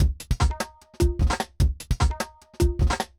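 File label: bangra, 150 bpm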